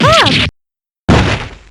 damage; p4u; elizabeth

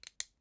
glasses

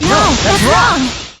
dual counter; hajime

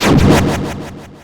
cinematic cut

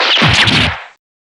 gunshot stab

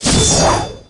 sword swing; dante

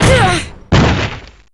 dynamic

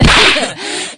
laugh; p4u; shadow aigis

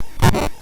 glitch

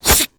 gut punch; kurogane

